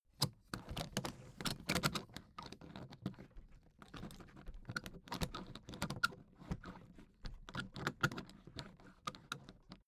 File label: suitcase latch lock handle handling